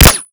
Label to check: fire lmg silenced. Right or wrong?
Right